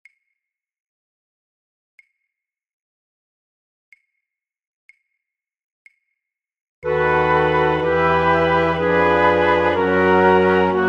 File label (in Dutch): fluitorkest, alle partijen; voor oefentracks; Matthaus Passion